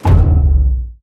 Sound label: damage hits explosions